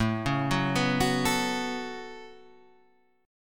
C sharp 7th Suspended 4th Sharp 5th, A, guitar chord